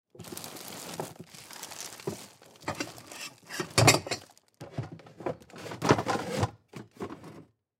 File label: Cupboard Rummage, Cupboard Rummage Bag Can Movement; Rustling; Blastwave